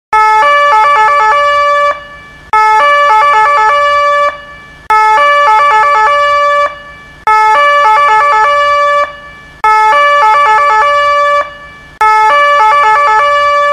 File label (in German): polizei sirene sterreich ringtone